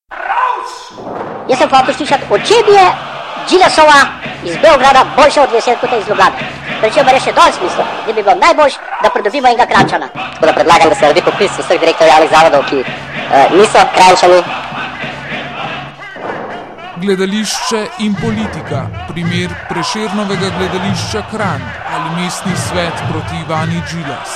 jingle

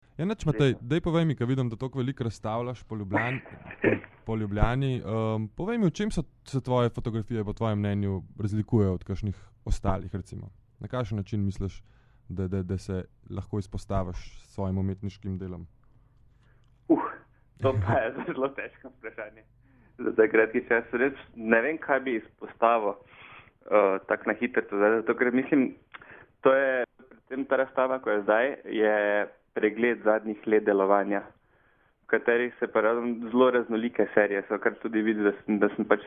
sitar

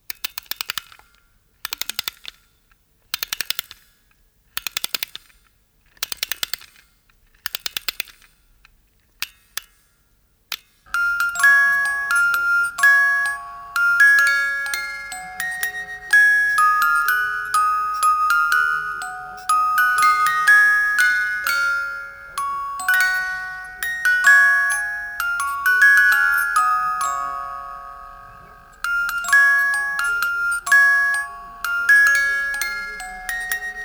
MUSIC BOX

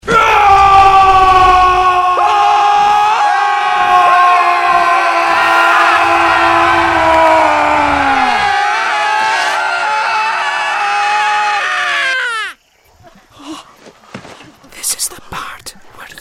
SCARY SCREAM; SHREK

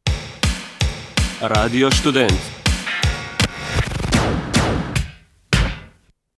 RADIO STUDENT JINGLE; KACHO

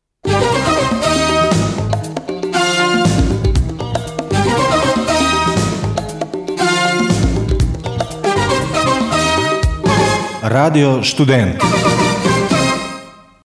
RADIO STUDENT JINGLE

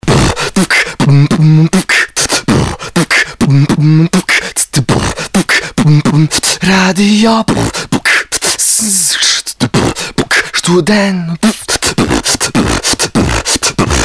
BEATBOX; STUDENT

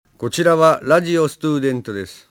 RADIO STUDENT JINGLE JAPONSKI

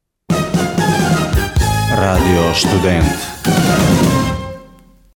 RADIO STUDENT JINGLE

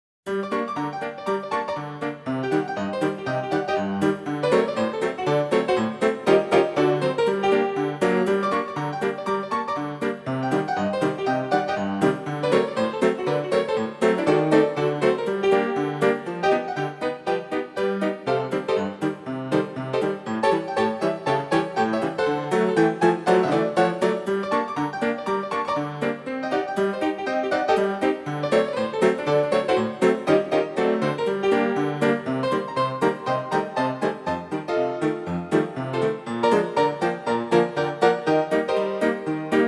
Comedy Music Intro

